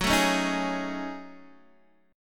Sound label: F sharp Minor 6th Add 9th, Guitar DADGAD; chordshape; down